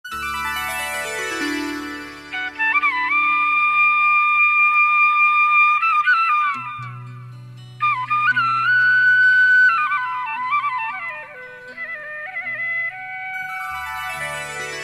pakistani folk

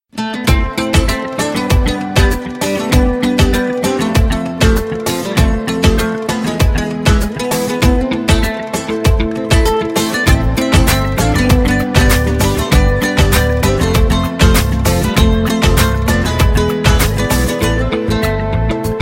Bengali music ringtone

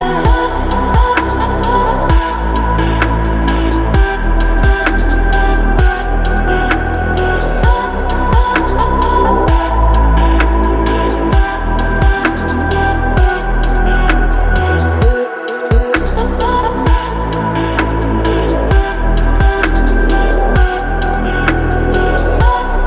beats ringtone

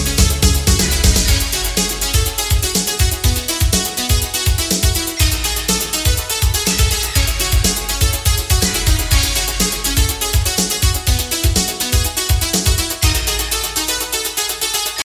latin freestyle ringtone

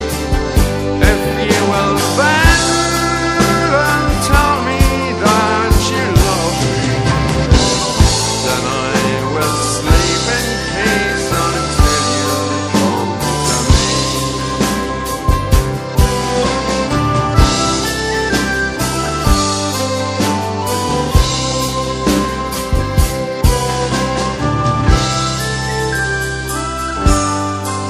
cool ireland ringtone

